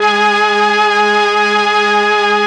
STR, M400; ILIO; Propeller Island Legendary

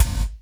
GT KICK; E2 DRUMS; Crypton - DrumBase5000

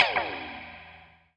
NOIZ, Guitar; 90 s Sample CDs; Quantum Leap - Guitar & Bass

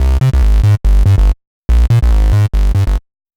Bass, uk garage, 142bpm; Szeet

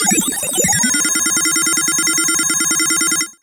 Glitch fail

